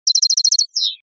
tititichio; campero

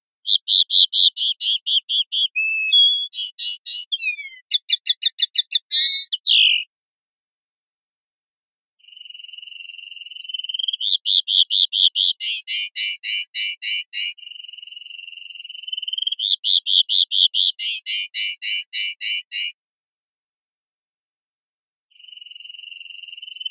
notas discordantes; pardillo

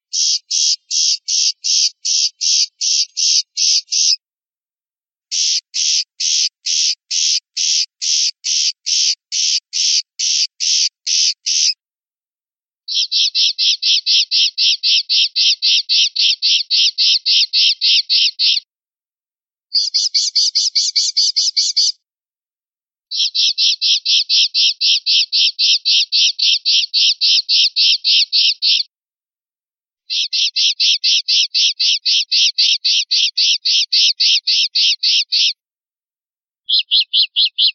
rabieta achillonada; pardillo

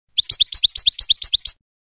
campanilleos capi capi, campero

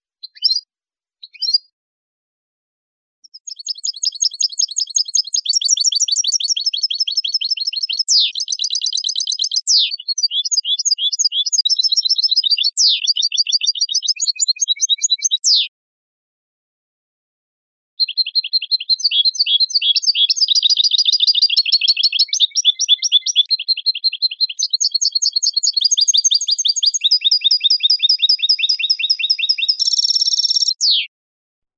faltas discordante

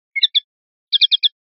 toques de tateo; pardillo; rep adornos

